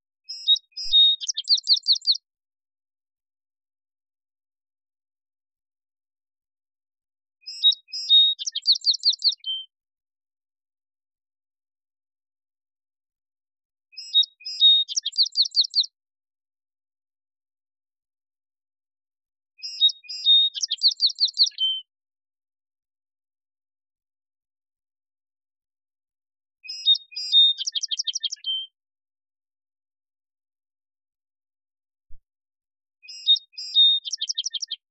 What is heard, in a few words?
Song Sparrow; Ontario Nature